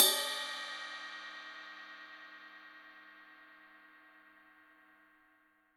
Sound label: CYM, Real Drum Kit, FUNK KIT; REAL DRUMS